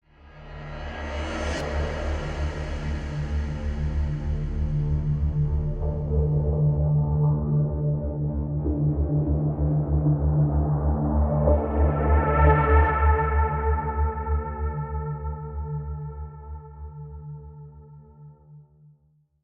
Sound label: Synth Cinematic Fall Through Jump; Mass Effect Andromeda